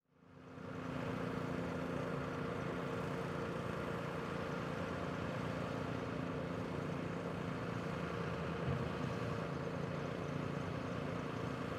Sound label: Ambience Loading Boat; AdobeCC